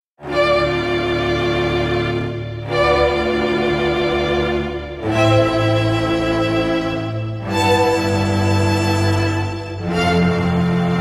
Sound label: Quintet